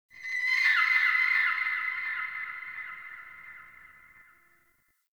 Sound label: Ext Creature Distant Mid; Warframe; Infested Eris